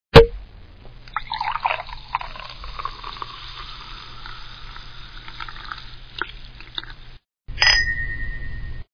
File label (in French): Champagne